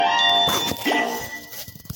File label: star poof